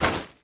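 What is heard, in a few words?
metal slide small; objects; Star Wars Obi-Wan; XBOX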